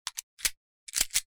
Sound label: hg reload